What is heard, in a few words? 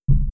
Windows Startup & Shutdown; Windows Never Released Soundpack 2